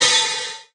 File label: Open Hihat; Drum One Shots; Cymbals; Hihats - Open; Cymatics; Eternity Sample Pack